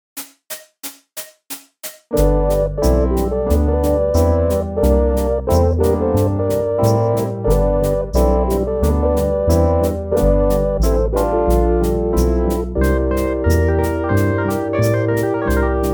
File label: Bleepin Monosynth Blues; reaper